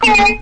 outta control, Electronic Hits, drums single hits

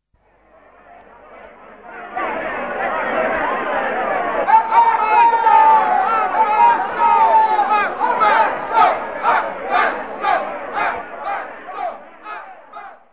marketplace and chanting; Sound FX Real Life

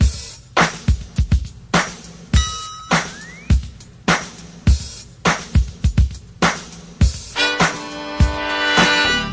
103 Intro, drum loops

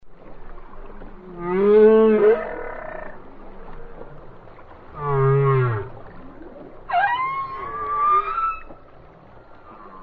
whale song; Zoo and Nature

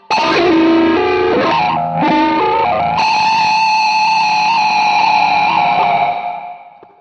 ugly synth guitar

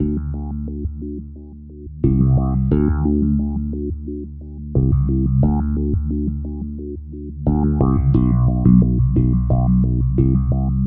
088 phasing electric bassline

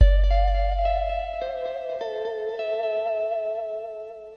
110 808 bass plucky melody; SAMPLED MUSIC LOOPS

